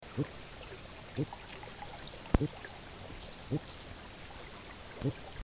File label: Pygocentrus nattereri, Amazon, soniferous